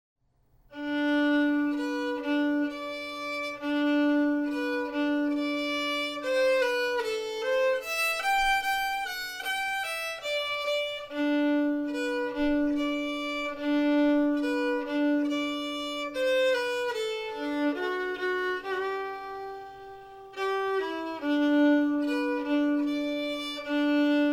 Vals slow, fiddle

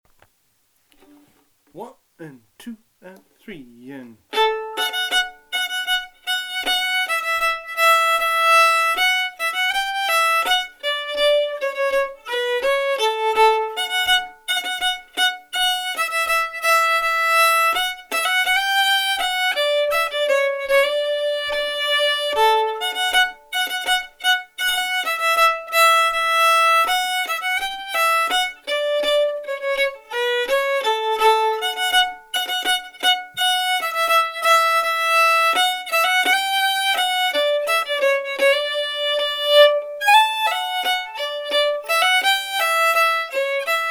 Springdans slow, fiddle

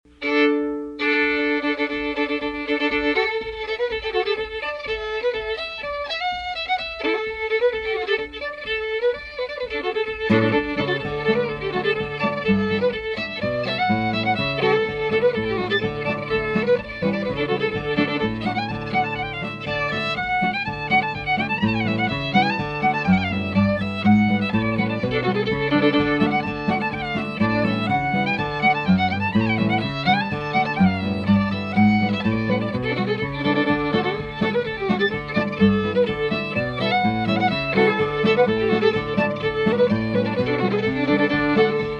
D tune; greatlakes; lotus

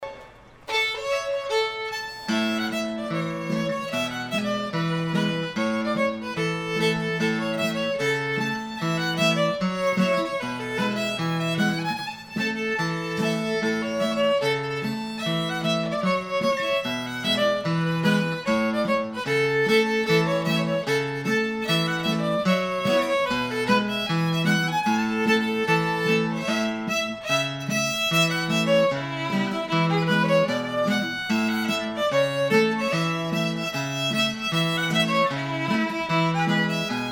Schottische; greatlakes; chicago; Storsveen Schottische

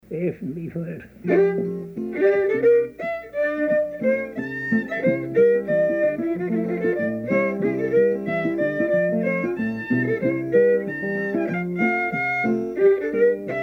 Bohemian Polka

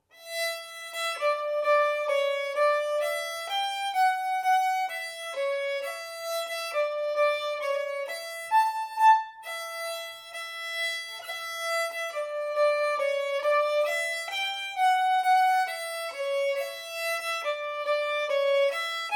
polska slow; Bromarv; fiddle

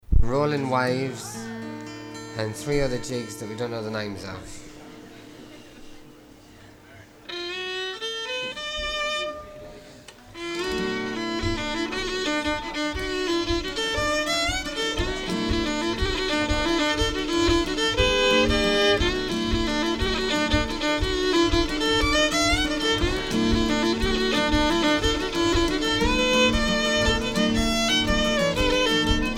set of jigs

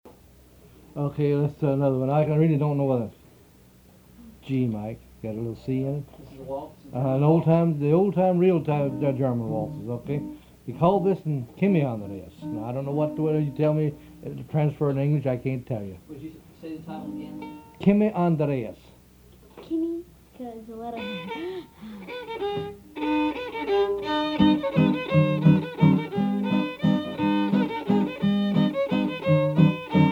German waltz; greatlakes

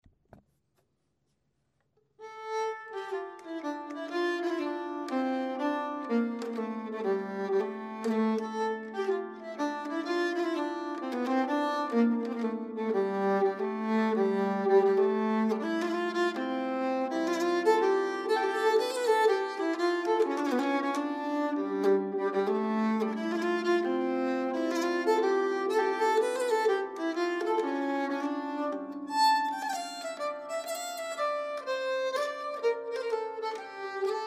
Orsapolska Swedish, fiddle

